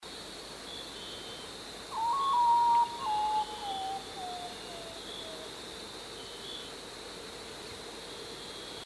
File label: Nyctibius griseus - Common Potoo